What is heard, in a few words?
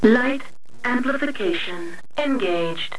LIGHT AMP; MECH2